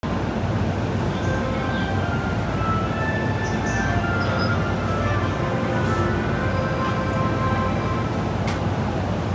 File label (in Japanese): 京浜東北線鶯谷南, train sounds